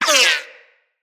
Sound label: Bullet Impact Ricochet